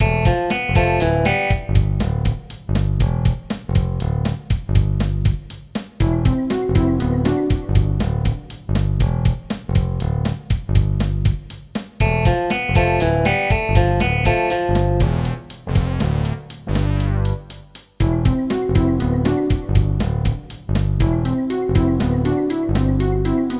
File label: endless loop, music